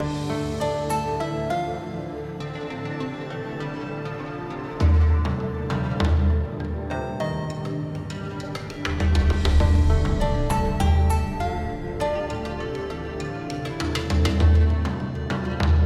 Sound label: Music Menu; Robbie Swifthand and the Orb of Mysteries; PS4